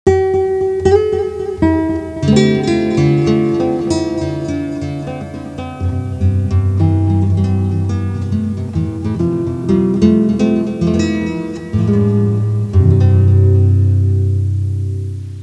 musica strumentale

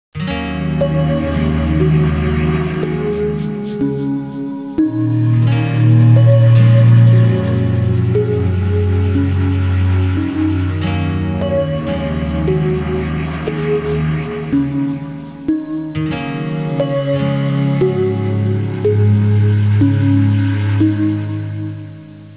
musica elettronica; electronic

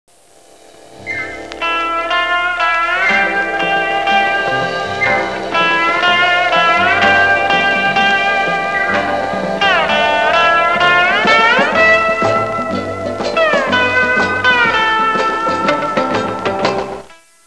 musica strumentale

